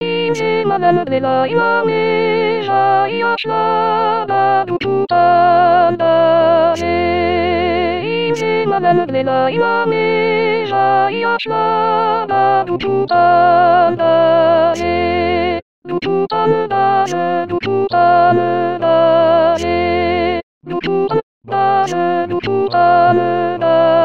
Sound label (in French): Soprano